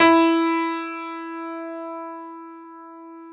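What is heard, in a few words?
piano, grand; Sound & Vision; Gigapack I CD 2; PIA GRAND 1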